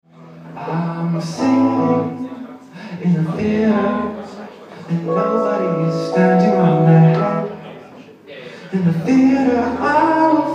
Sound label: flute live; business flute